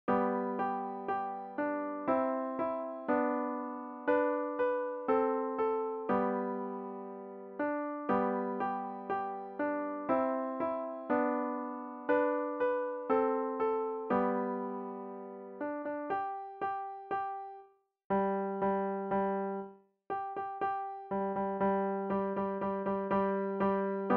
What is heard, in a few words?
piano solo; old macdonald